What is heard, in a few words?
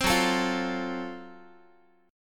Gb Minor Major 7th Flat 5th, Guitar, chord; strummed; 15,14,12,15,x,x; JGuitar